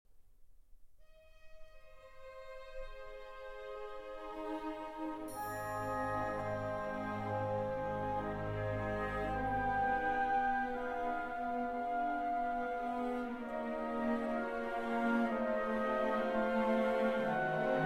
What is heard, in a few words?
Trumpet Concerto in C Minor, Andante sostenuto, Dolce Amoroso